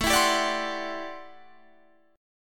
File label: A Minor 13th, Guitar, Standard; Am13 strummed; JGuitar